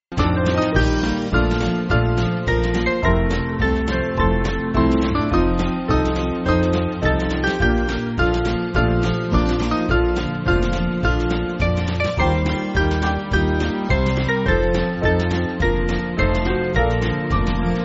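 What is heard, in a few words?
Band, Backing Files for Churches; O Troubled Heart; Sankey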